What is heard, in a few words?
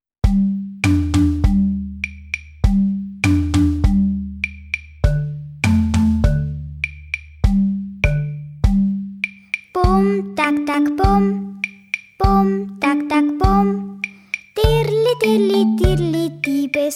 pum taeg taeg pum